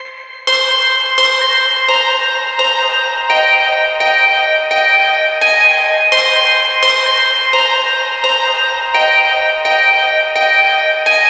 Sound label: Synth C 85; Morning Dew